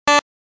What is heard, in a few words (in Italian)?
suoneria beep